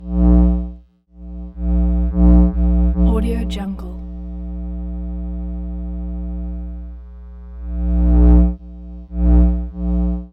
lost transmissions tonal passbys; soundmorph

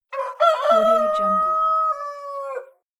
rooster

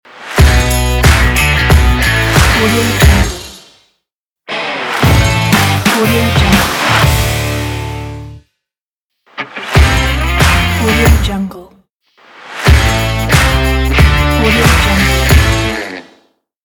rock opener pack

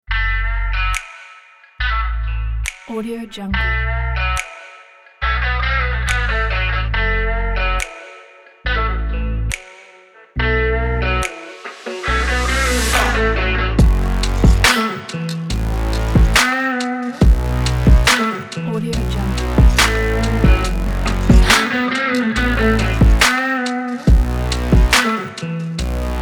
fashion sexy rock

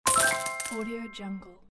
money prize

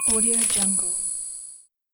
frost magic freeze spell cast

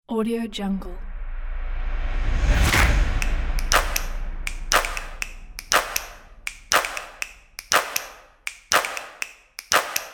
drums; elynamedia

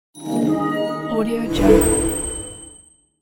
achievement unlock; infinity-sfx-productions

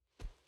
dragon footsteps; prosoundfx

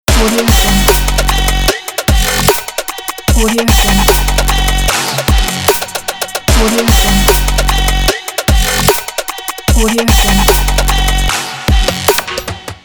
fire trap logo

